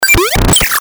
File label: Break Squared; Best Of N2O Library; Breakers & Transitioners